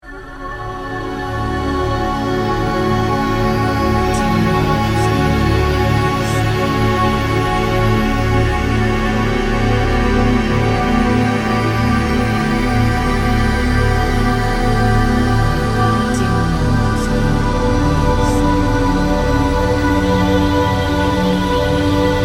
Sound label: ambient